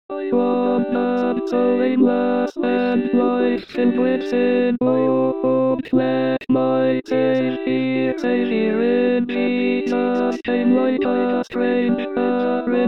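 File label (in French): Ténors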